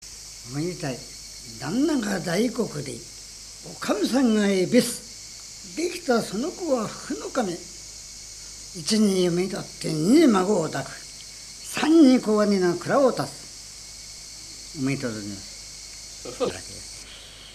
fukunokami, minyou

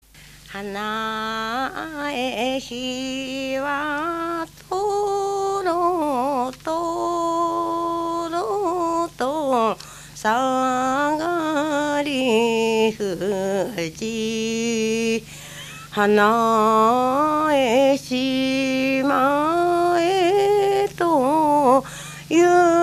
taueuta, minyou; 市原市; 八幡; 労作歌; 田植歌

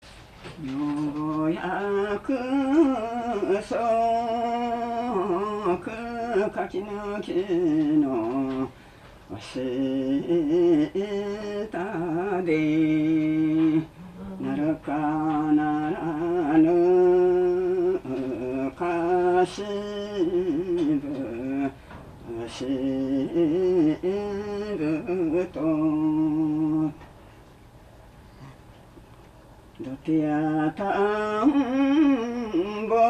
bonodoriuta, minyou; 柏市; 布施; 盆踊り歌

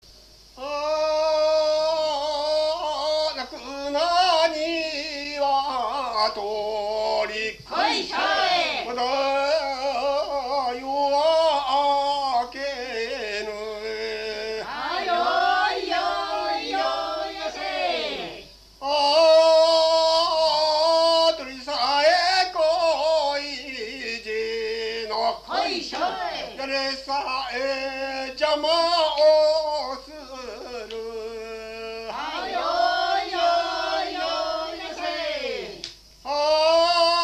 jinku; 勝浦市; 甚句

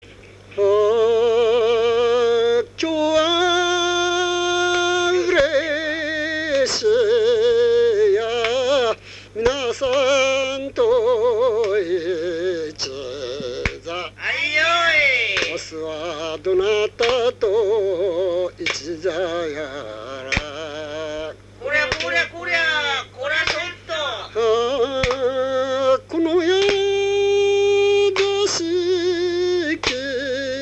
chayabusi, minyou

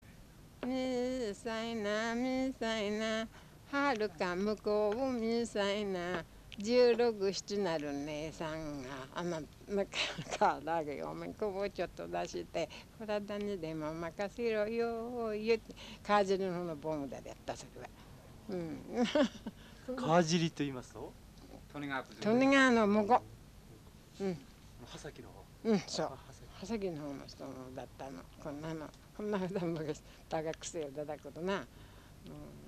bonuta

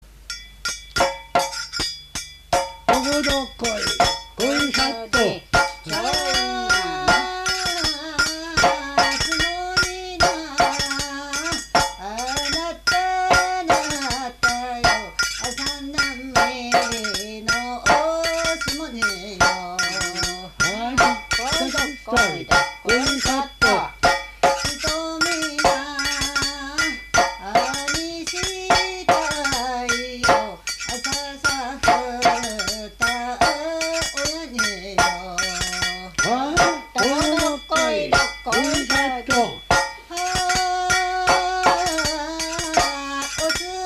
sumo jinku; 山武市; 相撲甚句

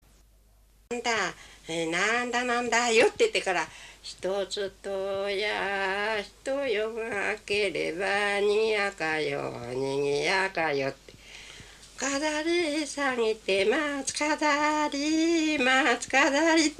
kazoeuta; 船橋市; 中山踊 桜づくしの中の数え唄; minyou

